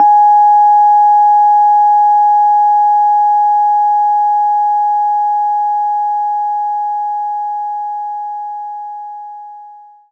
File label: Rhodes Ab4; knights try